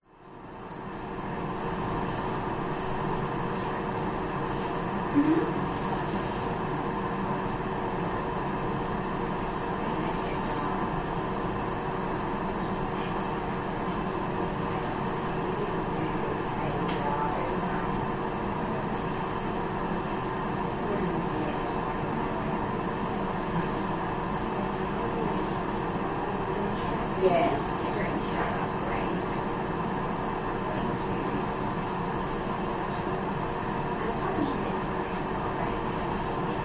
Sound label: hospital ward room ambience night quiet nurses chatter outside child sleep close